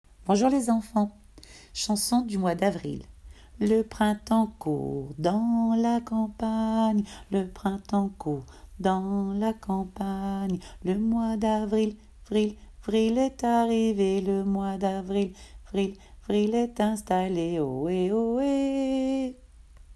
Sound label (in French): Chanson